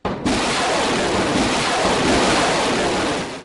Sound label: 3 cruise missiles SFX